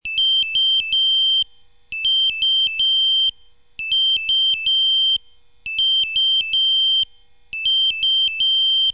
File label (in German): Kleinalarm; Alarmierung